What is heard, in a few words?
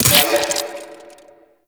Mech, Weapons, Sentient; Warframe; Shedu